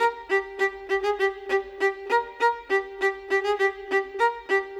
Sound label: Violin; Sonic Foundry (Sony Creative Software); Orchestral VOL-2; Modern 06